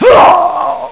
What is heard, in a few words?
death; action-quake2; quakeguy